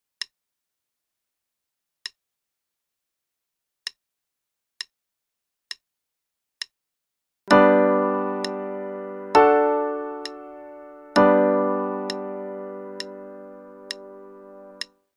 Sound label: G Major I-V-I pattern (qn=66); CM Level Prep